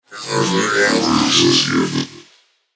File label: Replicating Menace Attack